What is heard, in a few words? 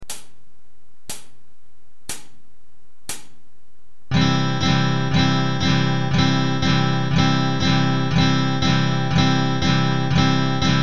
Strumming Exercise, guitar